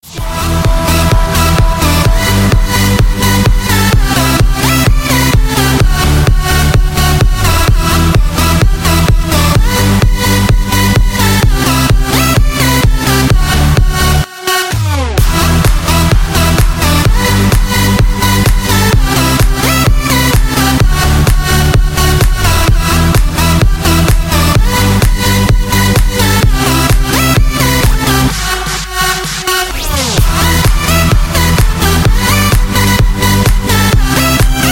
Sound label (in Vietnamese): nhạc dj cực mạnh